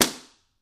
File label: Book Slam Slap Good